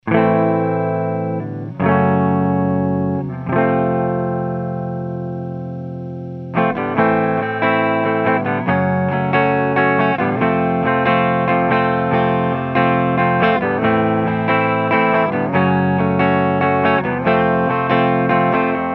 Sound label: AMP Clean MA200, clean eguitar; Mojave Audio MA-200